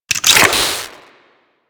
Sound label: Reload; Warframe; Acrid